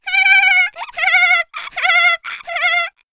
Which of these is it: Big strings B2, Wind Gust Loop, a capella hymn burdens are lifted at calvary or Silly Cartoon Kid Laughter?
Silly Cartoon Kid Laughter